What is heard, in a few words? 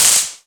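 destroy fluorescent light spark; Explosions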